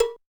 low cowbell; Roland TR505

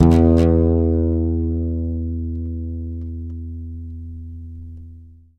SUSFE2, Bass, YAMAHA5 MUTE

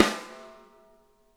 SNARE RIM; Sonic Reality; Interactive Drum Kits

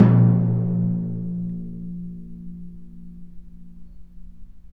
DRM, Orchestral Perc; Roland LCDP03

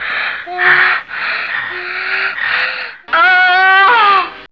SEXVOX; X-Static Goldmine 1

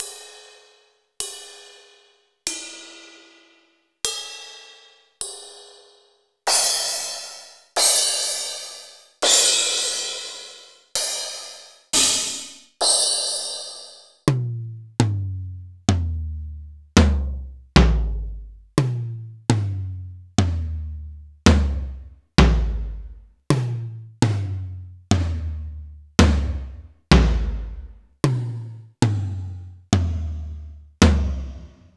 Alesis SR16

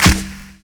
ZULU CLAP; Univers Sons - Kitch 70s

